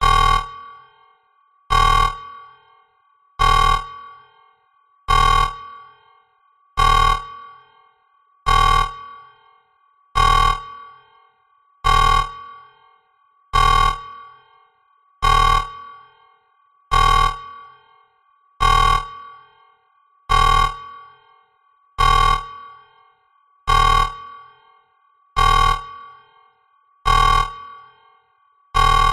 science fiction alarm big warning meltdown wailing deep tone medium